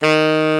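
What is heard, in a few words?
TENOR HARD D#, TENOR SAX; Northstar - New Gold VOL-1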